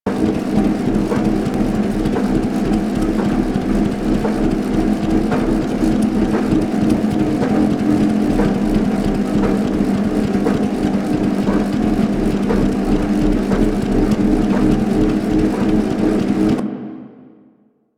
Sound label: Windmill Chemical Machines Loop; Ambience; Props; Loops; Eidolon Plains; Warframe